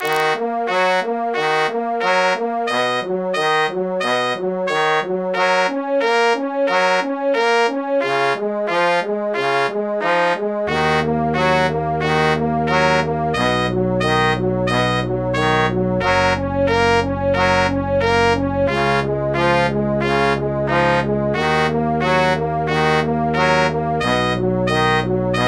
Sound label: brass band